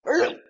Gag; Lewd SFX

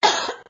Cough; Lewd SFX

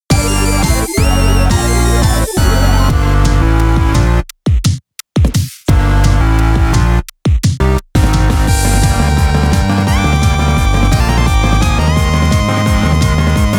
Normal Battle Fanfare; SLHRPG

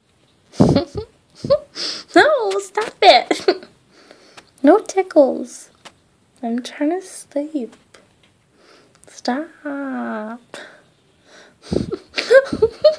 Giggles and kisses

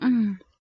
Moan

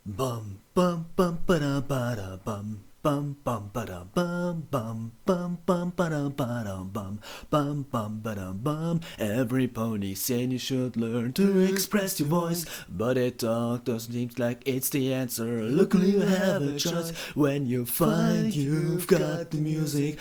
Vocal Cover

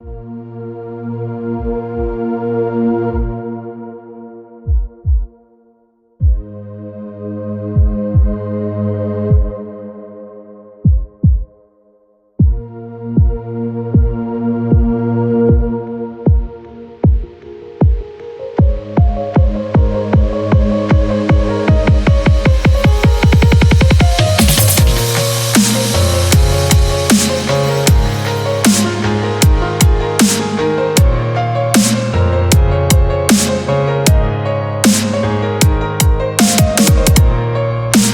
Non-Vocal Mix

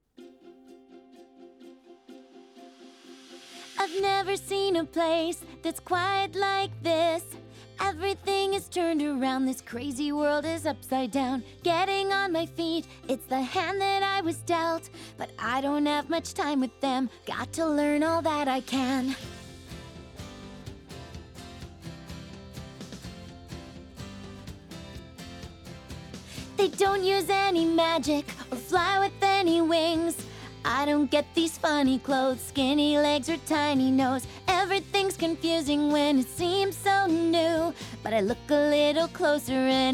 Acapella; Equestria Girls

